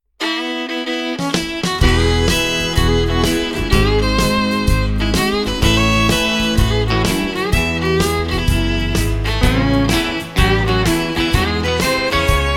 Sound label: singing country music